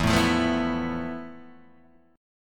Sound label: F Major Sharp 11th E